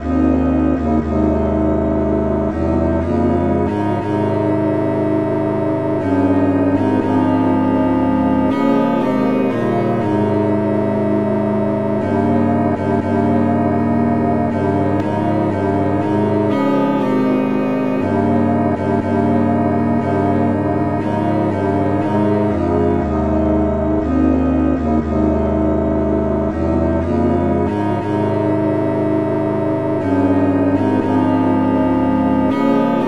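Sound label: traditional key C sharp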